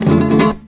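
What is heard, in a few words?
RASGAND D# minor, FLAMENCO Guitars; Spectrasonics; Hans Zimmer Guitars VOL-2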